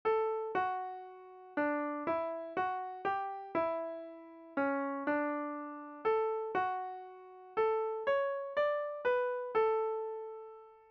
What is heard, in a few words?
hymn melody